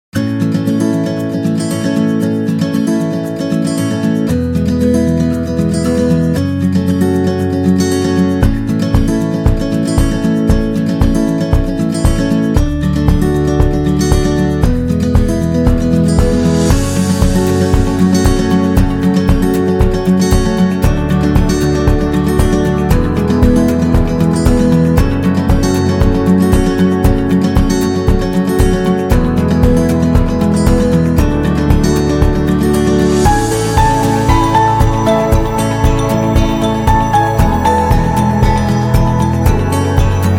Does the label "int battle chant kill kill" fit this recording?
No